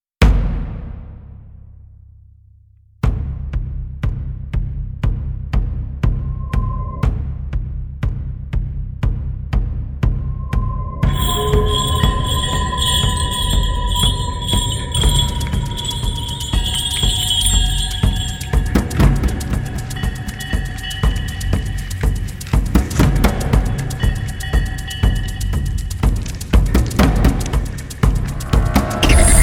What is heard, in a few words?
Acid, Comic Fast